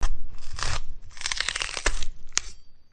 peeling face off

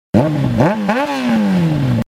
kawasaki ninja

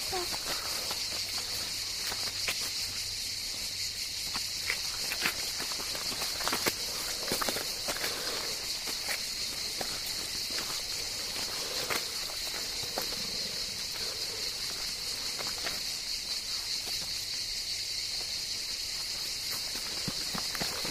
hiking trail

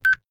Beep Short

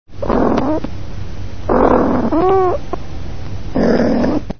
cat meow

